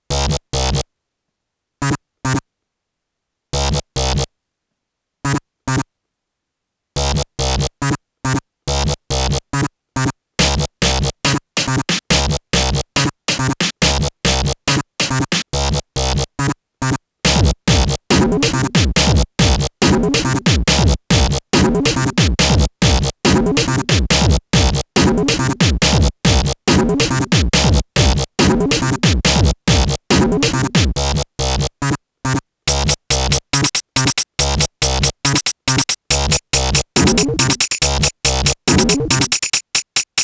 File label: wobble wobble